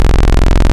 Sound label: Modular 3 VCO Saw, wavetables; Animoog